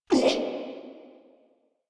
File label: Pain, Grineer Male; Warframe